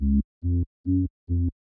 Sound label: Sub Bass Riff Db F Gb, 140bpm; Zero-G Sound Sense Grime Scene; GS Construction Kits; Astoria; 90 s Sample CDs